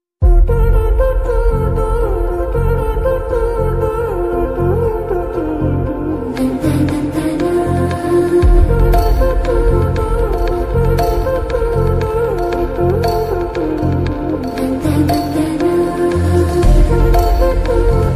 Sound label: Telugu ringtone; Family Star; teaser